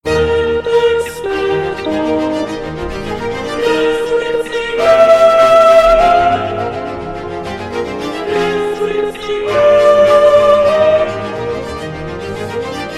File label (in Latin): Credo sop; Stage Zelenka 2023; zelenka zwv21